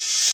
Reverse Cymbal, Hip-Hop

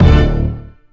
Kick

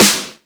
Snare; VEC1; Club

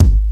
Kick; RnB

